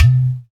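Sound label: percussion udu; Roland LCDP14 Africa VOL-2; Afro Menu 1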